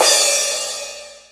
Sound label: crash; lmms; drums